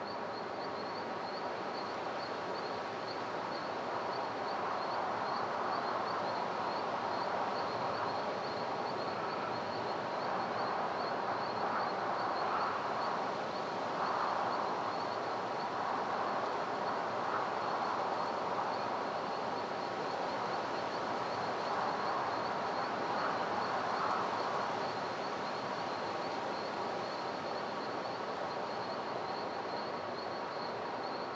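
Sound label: ambience Night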